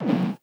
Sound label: BD Gate FX, Bassdrums; Vengeance Samples